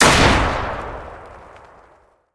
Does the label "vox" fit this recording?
No